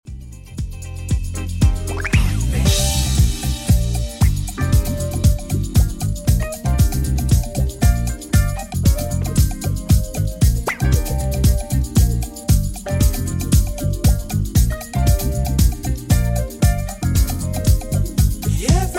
80s Redrum 116bpm CLEAN

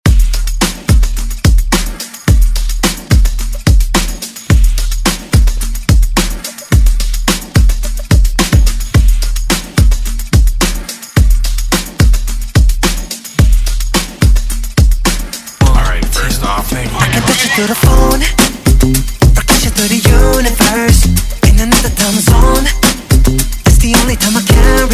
Top40 Redrum

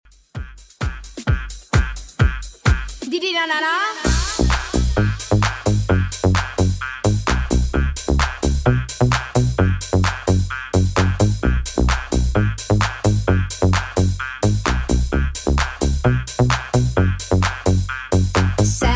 90s Redrum 130bpm

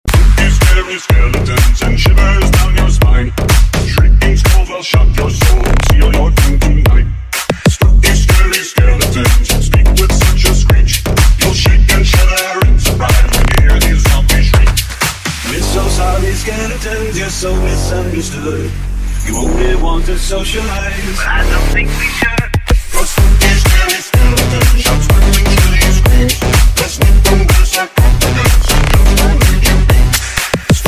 Club Party Halloween Banger

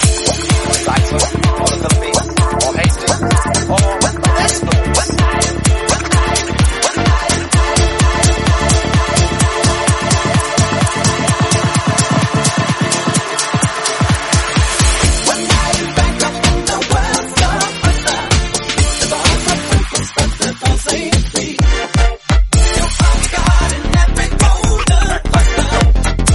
128bpm Dm

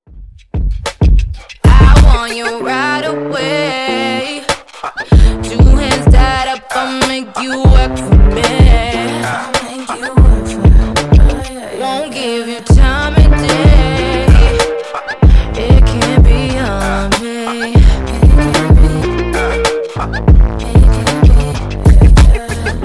Funk Intro Outro 95Bpm

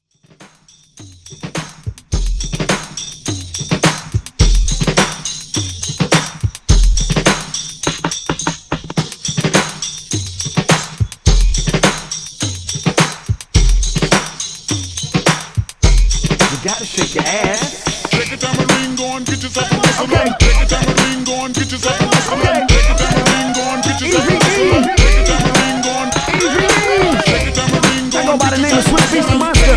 Re drum

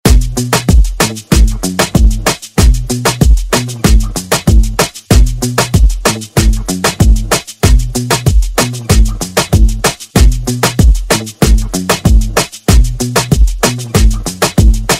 Moombahton; Redrum

